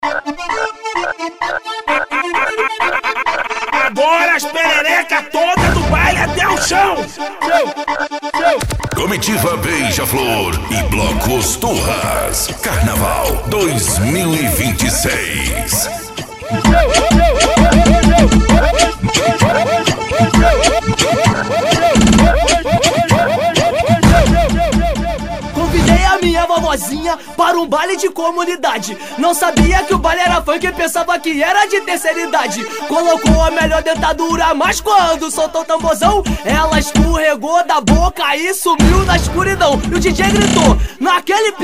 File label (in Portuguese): bloco carnaval; bloco os turras; comitiva beija flor